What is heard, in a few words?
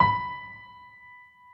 soft hit whistle; osu!